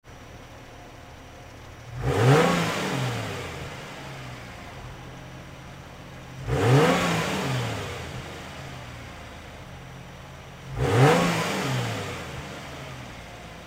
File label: 3000rpm outside, eng sound; 30RspecB